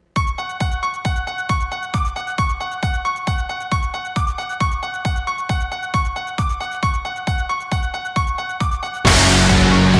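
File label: halloween reworked, sonnerie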